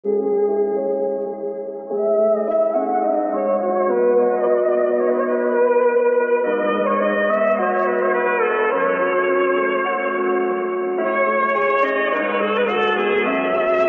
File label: sad background music sad song arabic song; tik tok; tiktok treand